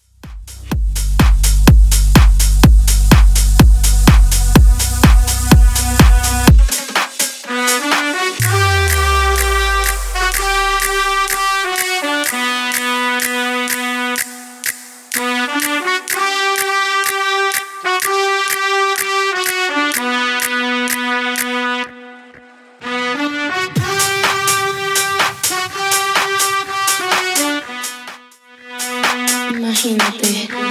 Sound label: Bass House 125bpm